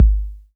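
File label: bass drum 808 Tape Decay C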